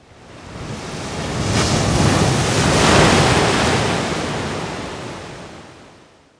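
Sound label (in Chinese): 海浪; 红色警戒2; 环境音效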